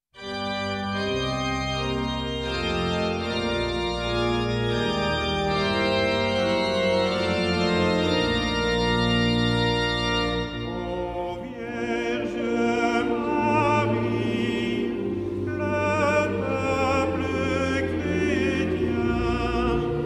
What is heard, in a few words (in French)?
Ave Maria de Lourdes, Petits Chanteurs; Petits Chanteurs de Touraine; Chants à la Vierge Marie